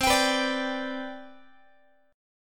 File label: C Major 7th Suspended 2nd C down Guitar, chord; CM7sus2 strummed